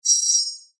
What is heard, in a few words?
Beep Tone Signal Multimedia